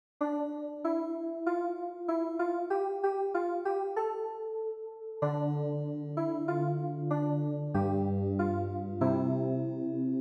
CLAVIER; ANAMNESE St Jean

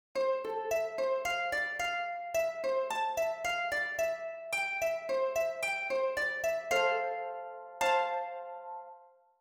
Psaume HARPE

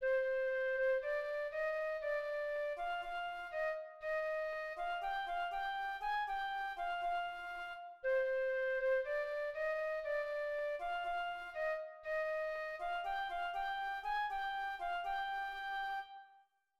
2eme FLUTE; ALLELUIA "Colombière"

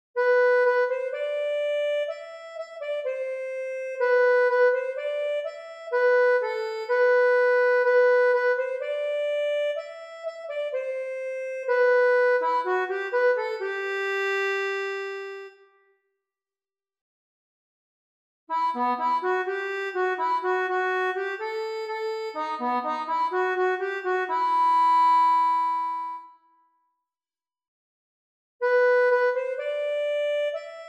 ACCORDEON

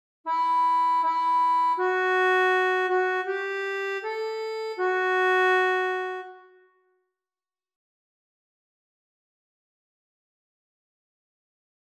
ACCORDEON

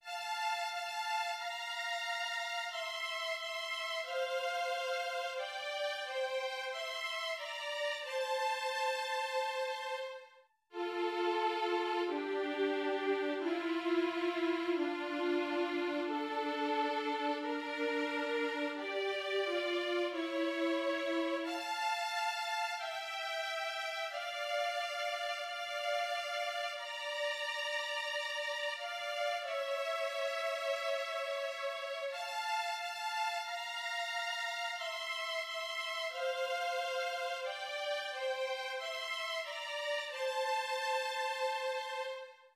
VIOLONS

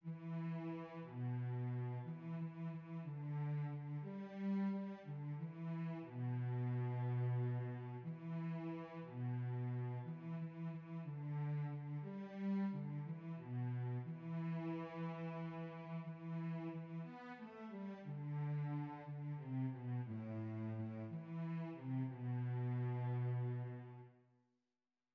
VIOLONCELLE

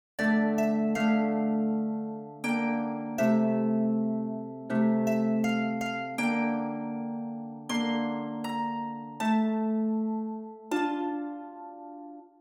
HARPE; Garde Moi Mon Dieu

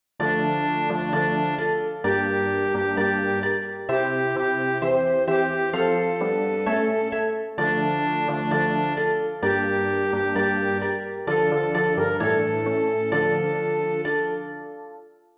ORCHESTRE

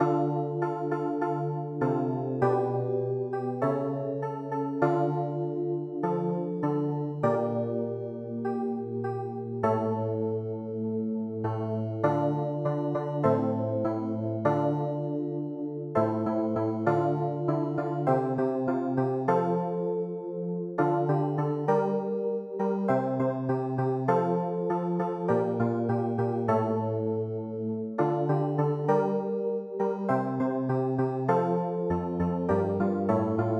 CLAVIER

